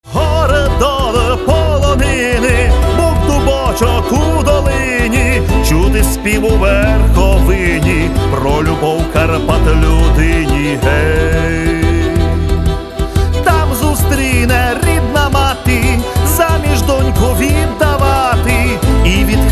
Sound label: Karpatskyi Chardash